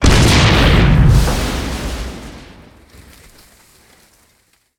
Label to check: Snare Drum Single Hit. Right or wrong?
Wrong